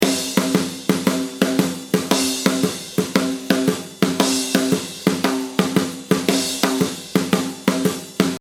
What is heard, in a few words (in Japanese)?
シャッフルビート